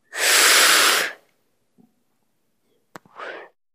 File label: Lizard Beaded Breath